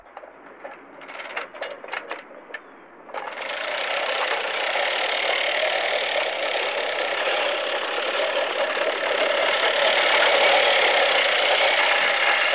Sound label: Lawn Mower